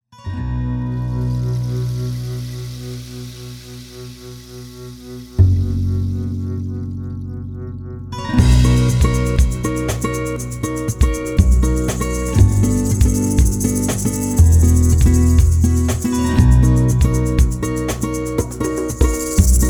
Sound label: 120bpm remix